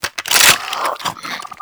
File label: Infested Swarm Rifle Reload; Shock Swarm Rifle; Weapons